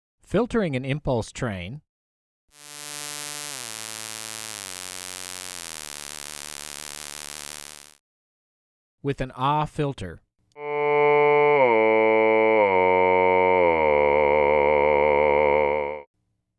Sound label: Source Filtered Impulses